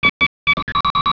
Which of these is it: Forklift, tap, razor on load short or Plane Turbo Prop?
tap